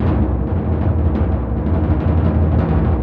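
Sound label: timpani C#, Synclavier Percussion; ILIO; Synclavier Percussion 2; World & Orchestral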